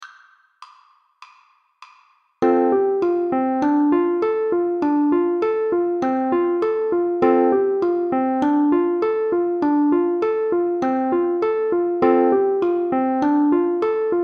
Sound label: 100bpm, uke